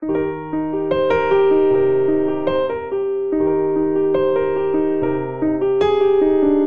Progression Ballad I vi Eb Major 72 bpm, Grand Piano; MIDI Clips; Ableton; Factory Packs